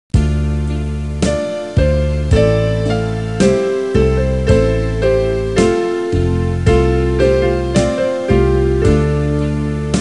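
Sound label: soprano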